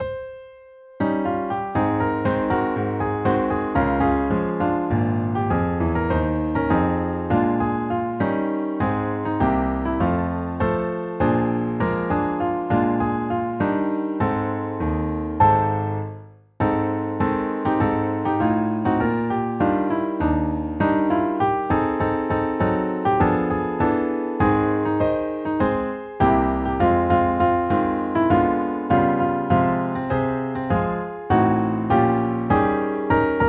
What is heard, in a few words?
Shrapnel Blues